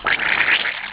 gurp

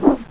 throw

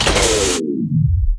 fuse out

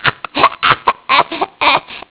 gurp; openffa

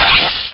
x light; lmctf

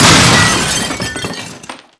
tv break

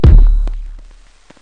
thump; lmctf; bounce

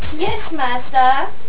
pain; pretzel

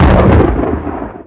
light start

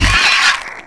brain pain; openffa

